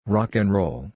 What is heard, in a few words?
rock and roll